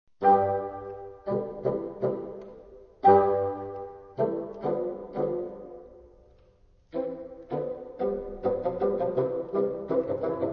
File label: pizz